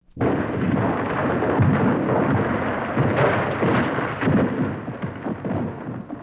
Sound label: Collapse